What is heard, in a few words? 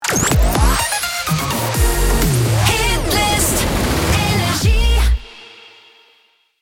Jingle; NRJ